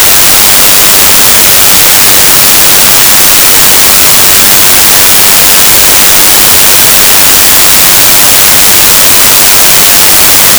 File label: noise